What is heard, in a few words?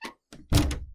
fusebox open, subway trains; MetrostroiAddon